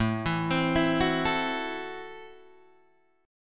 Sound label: A Minor 6th A Guitar Open D